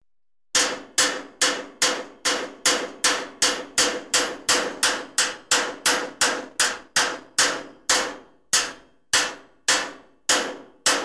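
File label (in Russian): молоток стук по металлу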